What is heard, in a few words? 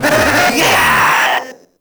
Proto Infested Runner Player Spotted; Warframe